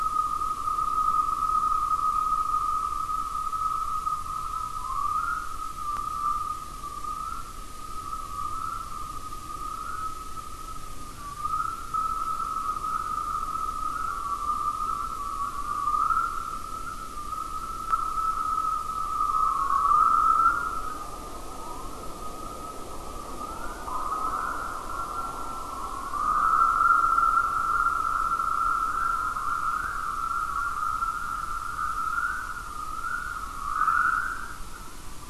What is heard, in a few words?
WFR audio emfisis